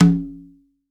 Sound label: CONGA, STEREO CONGA